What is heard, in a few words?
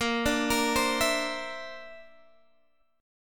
D 7th Suspended 2nd Sharp 5th A sharp